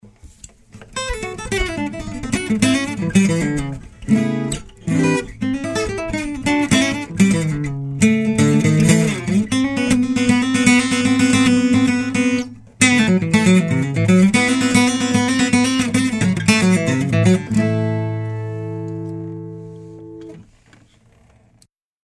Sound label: Sample, guitars